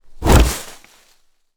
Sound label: creature wood attack whoosh impact leaves; Bluezone Corporation